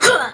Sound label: jump; Alien Arena; ai